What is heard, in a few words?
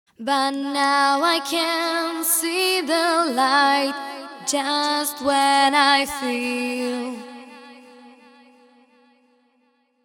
VOCAL WET